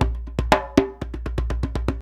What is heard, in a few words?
119 JMBFNK; Univers Sons; Basicussions